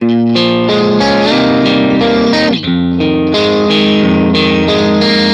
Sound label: Guitar Riff Fm 90bpm rock groove